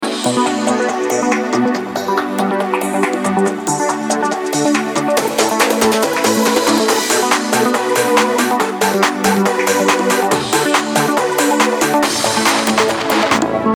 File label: Opening Loop